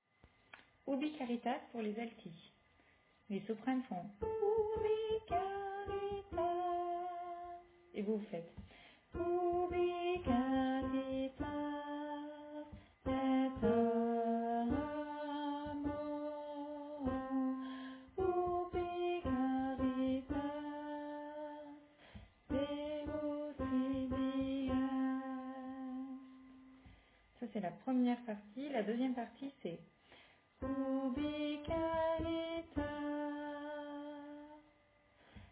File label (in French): Alto